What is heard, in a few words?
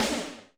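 Very Nasty, Alesis SR16 drum machine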